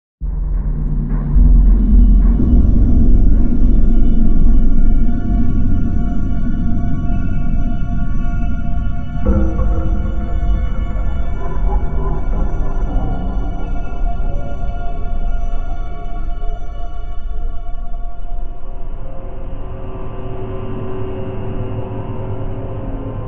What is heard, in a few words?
Hunt Ambient